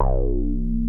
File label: JUNO 60 C3; Hollywood Edge - Giorgio Moroder Rare Synthesizer Collection